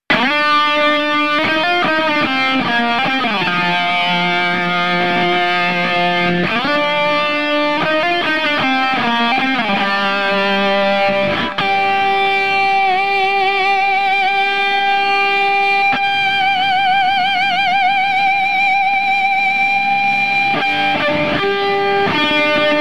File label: guitar